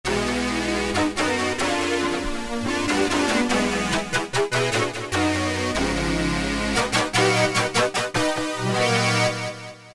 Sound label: Korg microKORG Hardcore Hit; Factory Voices